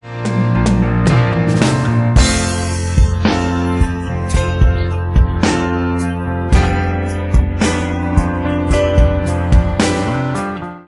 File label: accompaniment